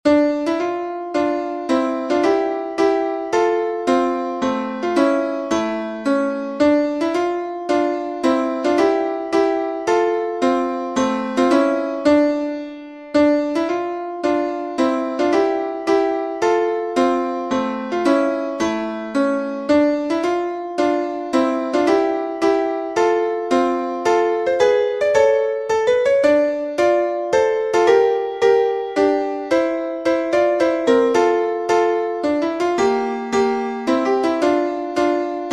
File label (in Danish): Hambo med Vals